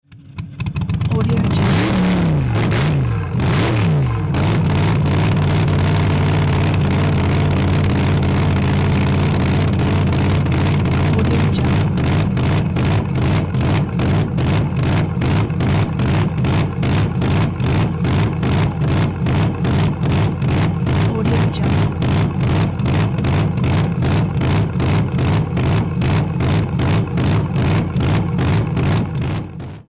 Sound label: deep chugging car engine revs